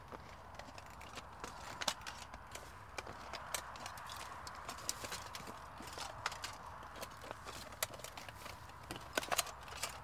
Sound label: Knight Walking On Lawn